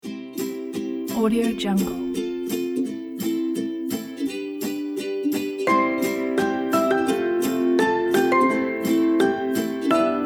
cooking show

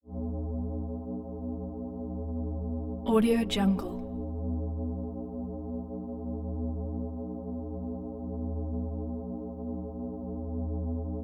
low drone pulsing tone continuous

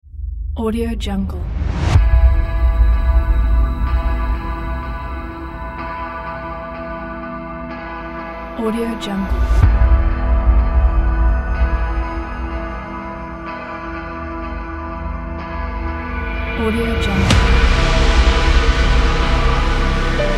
electronic cyberpunk impact; rainsound

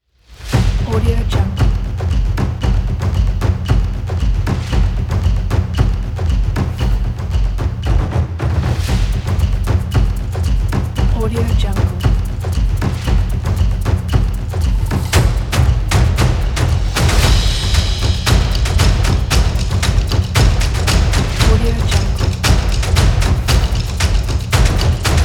in drums; soundbay

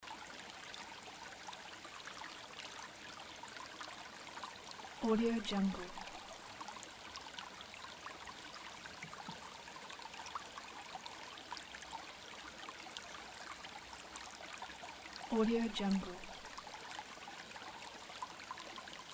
stream in the forest

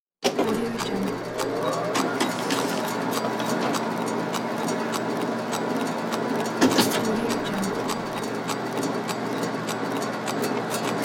photocopier

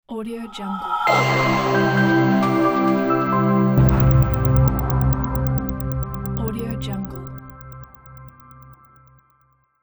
minimal logo

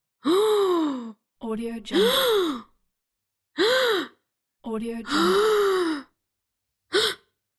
female gasp pack; mrsoundfx

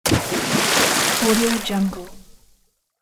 water splash

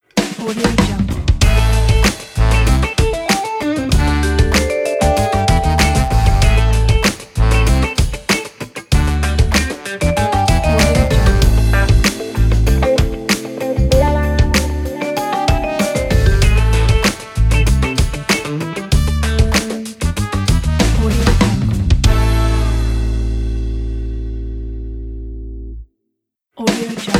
funk ident

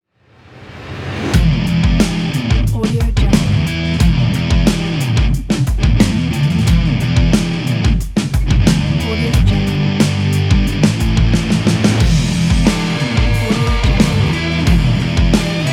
cool rock riff

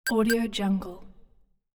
hud notification

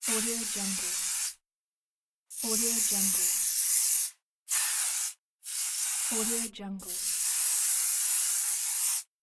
hair spray

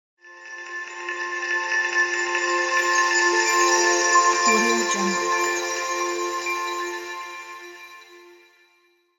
advertise logo

